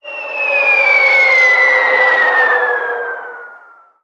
damage hits explosions; World Of Warships

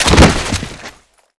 big jump bodyfall; Call of Duty Modern Warfare

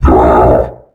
commando jump

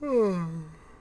Yawn Vox; Civ4 warlords

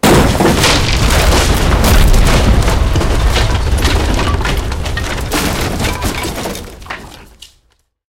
tanks explosion wood; War Thunder

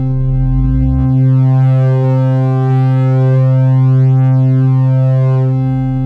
hack cube tone

